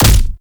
Fulmin Prime Punch; Weapons

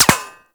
Bullet Impact Metal; Warframe; Weapons; Bullet Impacts

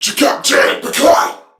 Surprised Crewmen; Warframe; CorpusCrewmen; CrewmanB

